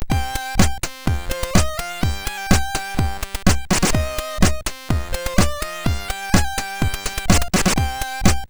MOS6581R4, sid; hvsc

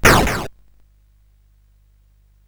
sid CSG8580R5; hvsc

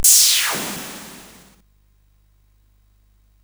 sid CSG8580R5; hvsc

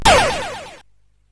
Remix, sid CSG8580R5; hvsc

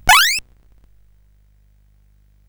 sid CSG8580R5; hvsc

